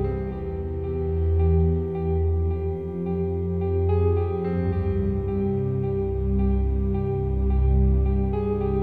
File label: piano, Sad